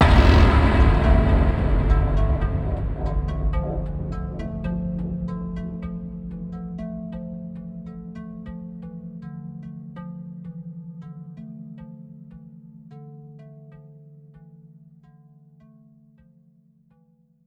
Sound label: Lute, Cinematic Impacts; 99Sounds; Rumore